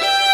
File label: violin; osu